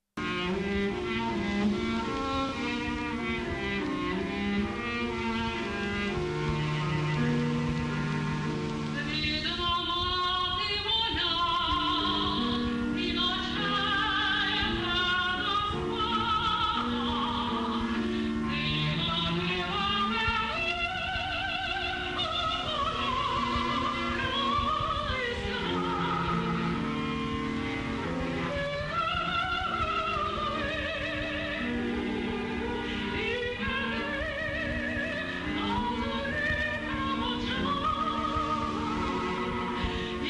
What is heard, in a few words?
Ukrainian art song; music Platon Maiboroda; words Andriy Malyshko